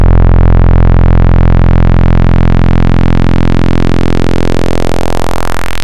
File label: Saw PW Bass; Basics; Ableton Live 12 Lite; Vector; Sprites